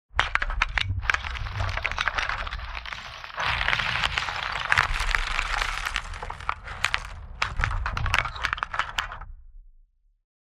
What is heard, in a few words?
collapse